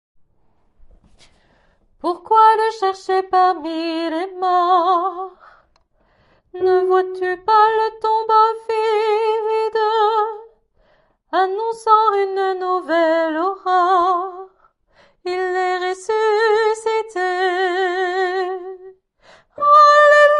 Il est ressuscite Tenor

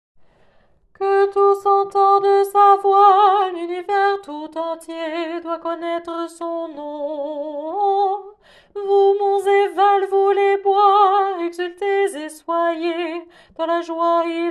Que tous entendent sa voix tenors

